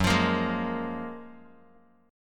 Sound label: Gb Major 9th, down, Bass Standard; GbM9; strummed; JGuitar